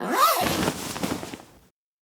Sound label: duty out